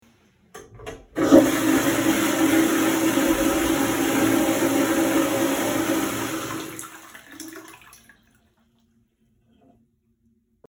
sliv unitaza

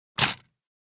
validator biletov

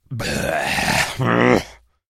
Reakcyia brezglivosti muzhskaia

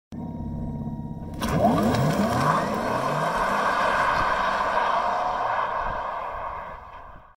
porsche taycan sryvaetsya s mesta

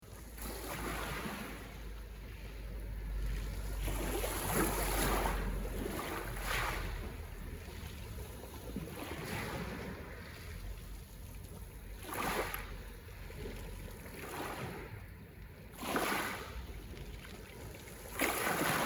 plesk voln

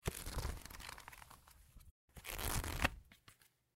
zvuk perevorachivaniya stranic

